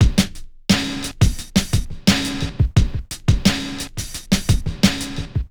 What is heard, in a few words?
Urban Beat, Drums